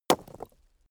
stone hit stone; natur-tiere; steine

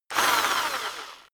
rock drill rev medium short

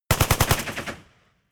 rifle ak-47 exterior fire burst reflection; comedy-cartoon; Pistols and guns; pistolen-gewehre

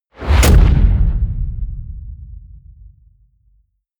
wham, whooshes

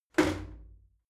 shower slide door open hit regular

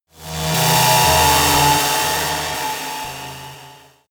sci-fi cosmic dark energy disturbance; horror

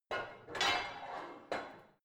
barbell heavy plate put on; sport freizeit; fitnessstudio

